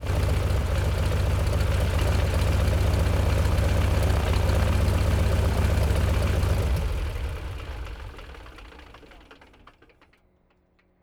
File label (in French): EXTINCTION FRONT